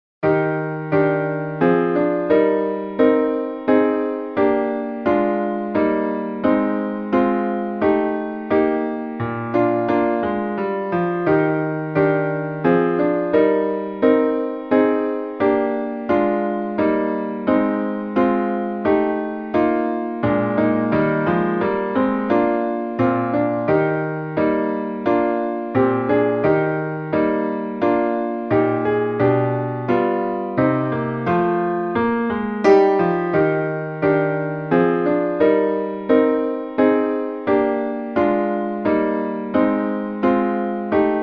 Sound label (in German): Ode an die Freude klassisch Klavier